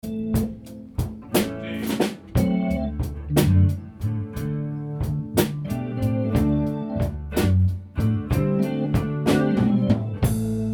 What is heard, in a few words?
Blues Jam in A